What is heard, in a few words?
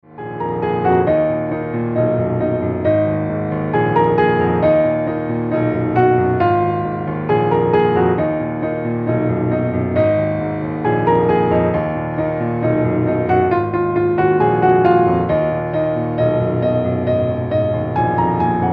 piano cover